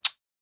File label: state Bet Fast, casino